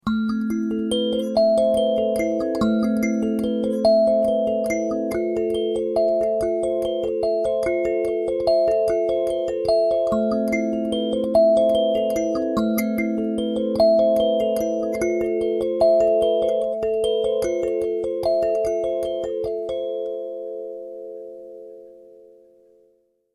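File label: Sansula A minor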